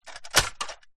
science fiction robot android metal single footstep